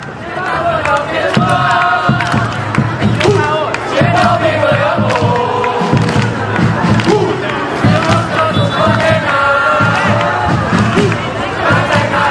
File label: allez allez hanoi fanchants